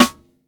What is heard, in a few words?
wet steel snare drum sound c key